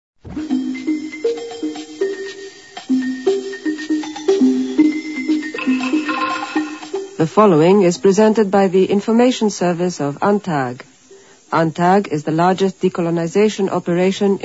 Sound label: interval signal; UNTAC R via SWABC [8/89]